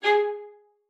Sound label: G#4, VIOLIN SPICC; Xsample VOL-5